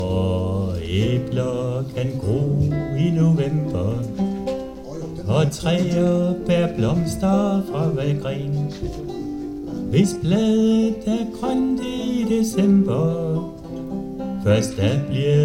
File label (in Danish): Nar kun vore floder er fri; irske aften på Ellegården fredag d. 14. marts 2025